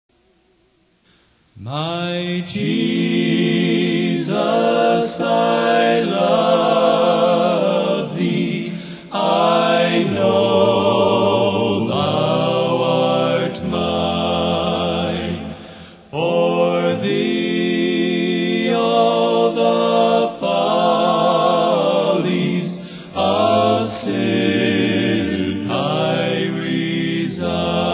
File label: Old Hymns A Capella